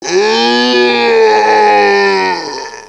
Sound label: giant die; eqclassic